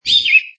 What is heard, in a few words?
Call3, Bird calling, single bird tweet / chirp; mono; Birds; Animals; LynneMusic